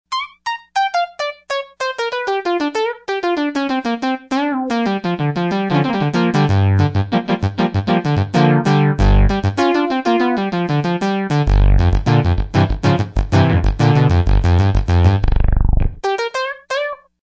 Yamaha TG55; Factory Presets; GrooveKing

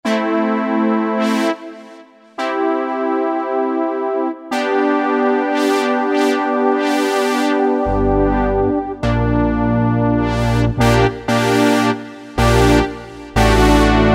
BRASS; Akai SG01v; AFT

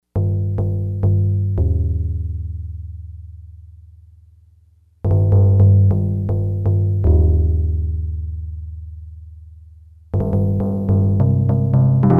Timpani, Yamaha DX100; Factory Patches

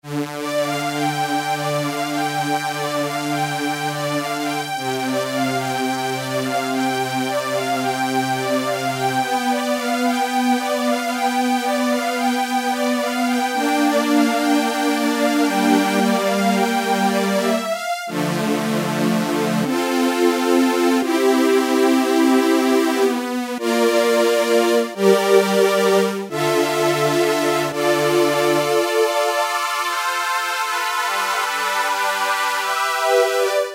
Korg microKORG String Machine; Factory Voices

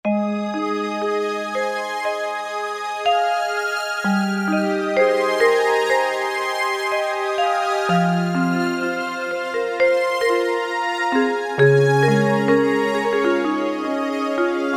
Vibes & Strg, Korg MSC-8S Percussion

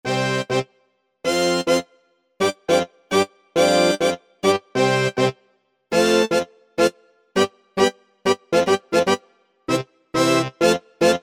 Pop Horn Section; Apple GarageBand 2

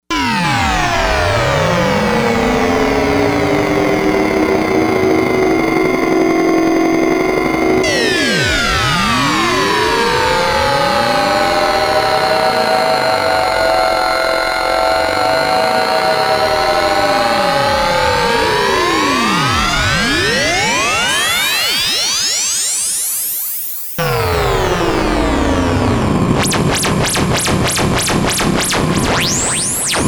Novation UltraNova; Factory Patches

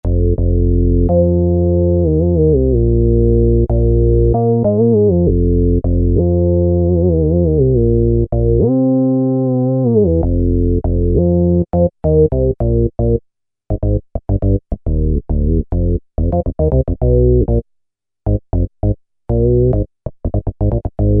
Korg microKORG MG Bass; Factory Voices

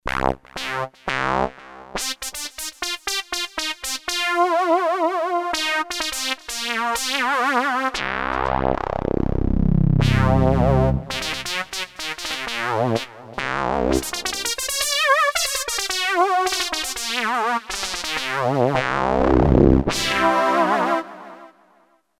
Waspy, Roland JD-990; Vintage Board

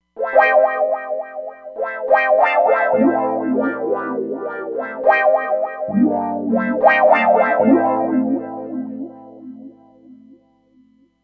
Wah Gt Riff

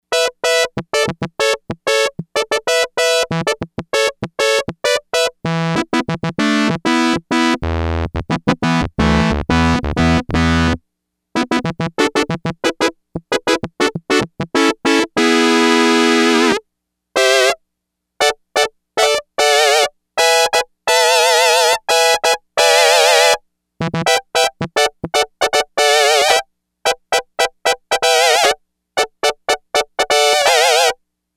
Hard Brass, Yamaha DX100; Factory Patches

